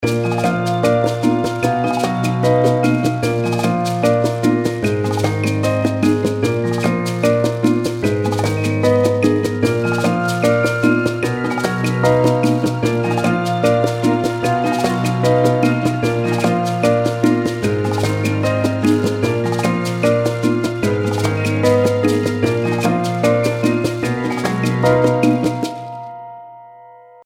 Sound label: Bolero